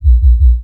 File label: kick